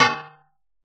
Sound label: deep clang; replicaisland